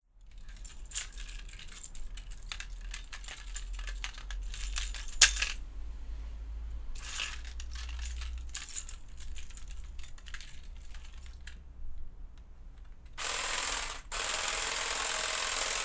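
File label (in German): Outdoor Ambience Schluesselbund elektrische Gartenschere Gross AB Lewitt und Orpheus Kugel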